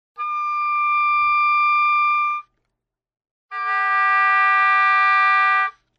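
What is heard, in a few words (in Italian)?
oboe Suoni Doppi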